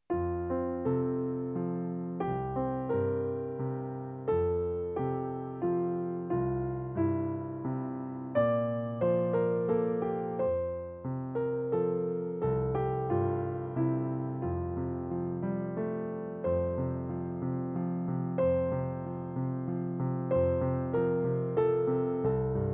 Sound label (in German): Dona nobis pacem F-Dur Klavier